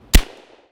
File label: Shot